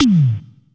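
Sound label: tom, Casio CTK-5000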